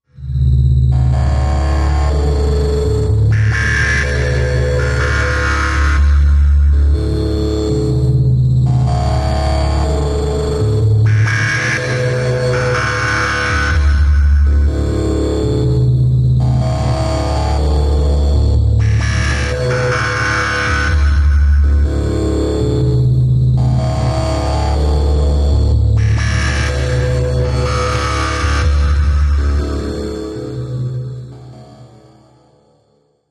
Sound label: Static, energy beams